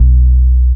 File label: Elc Bass; Equipped Music